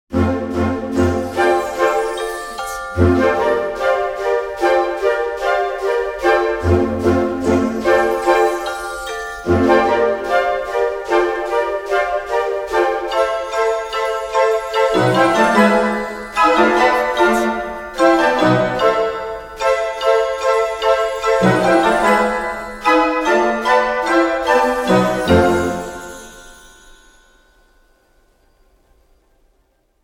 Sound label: Its Magical orchestre, musique aventure epique